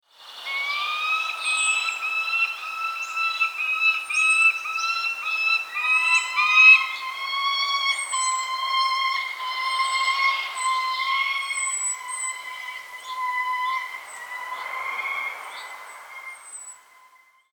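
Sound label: peregrine; peregerine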